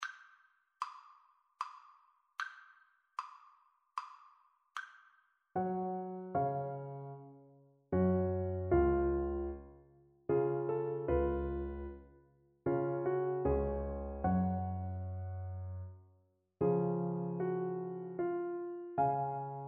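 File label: viola karaoke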